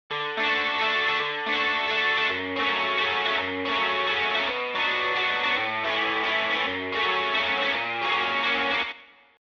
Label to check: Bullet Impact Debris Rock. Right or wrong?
Wrong